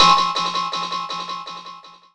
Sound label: Hit